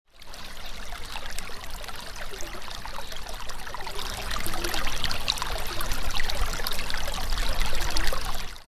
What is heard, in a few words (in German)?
bach plaetschern